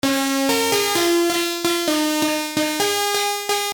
SYNTH Vocal Shot

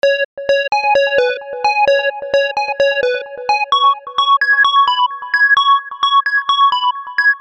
122 G Synth Bass

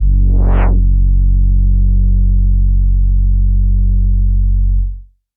Attack Bass F 1

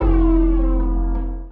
F Maj, Flange

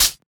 hat

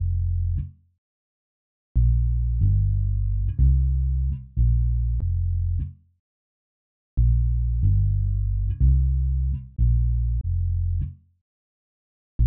Bass

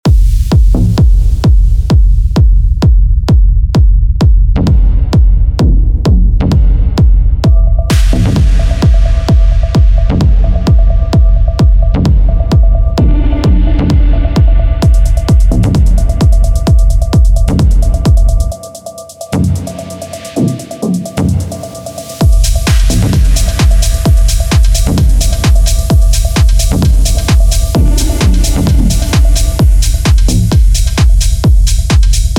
Techno Sounds D; Save Space

